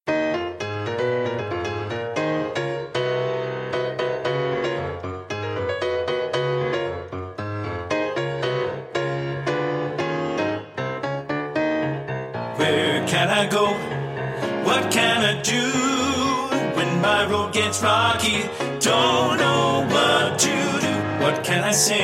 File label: Shout Glory TTBB